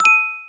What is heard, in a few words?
plucky